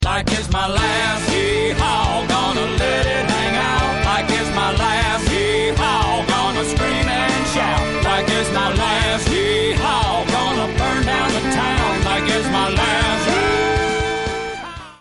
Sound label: Cowboy Yeeehaa